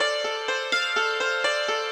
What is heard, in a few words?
ethno, 125, A